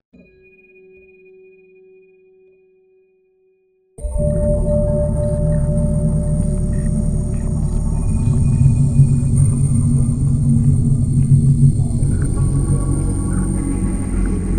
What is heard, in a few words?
sirene